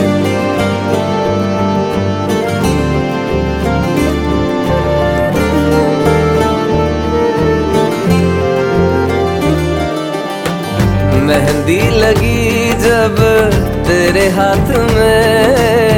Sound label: bollywood ringtone